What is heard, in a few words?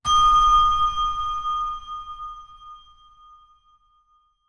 Surreal Glassy Ping